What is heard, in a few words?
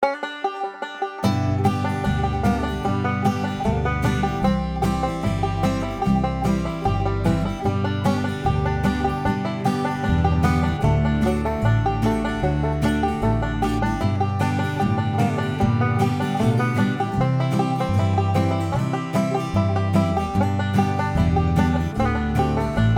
5 String Banjo Natural Style